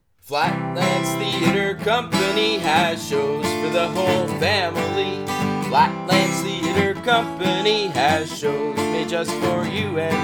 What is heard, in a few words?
jingle; flatlands jingle